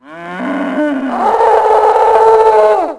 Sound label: DEAD COW; Mega Game; Team Alligator